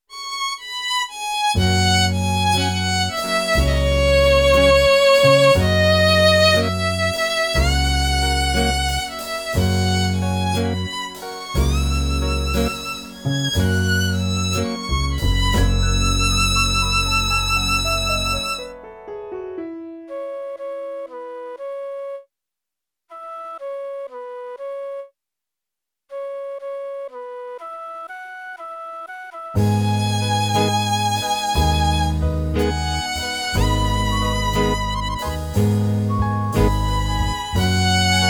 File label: E major flute music